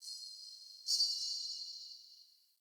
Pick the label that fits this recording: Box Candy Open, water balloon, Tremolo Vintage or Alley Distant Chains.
Alley Distant Chains